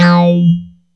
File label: NOISE; AKAI S6000 CD-ROM - Volume 1; SAMPLE WAVE MIXING 2; NOISE&SE